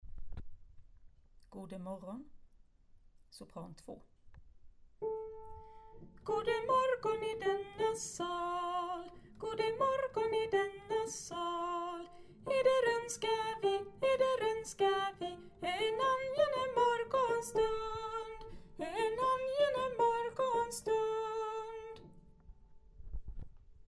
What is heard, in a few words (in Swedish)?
Goder morgon sop